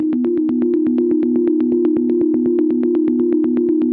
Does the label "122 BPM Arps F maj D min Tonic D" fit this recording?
Yes